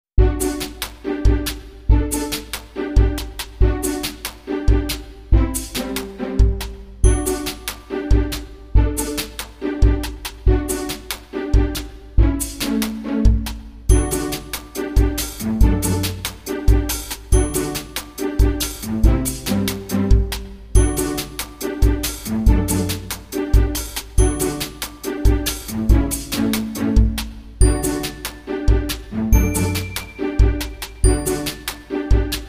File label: Tiny Bounce Strings